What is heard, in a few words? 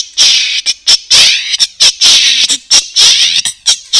cym flang